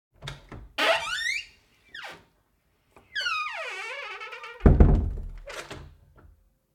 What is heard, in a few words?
Wooden Door, Opening and Closing, Creaking Door; Rogue Waves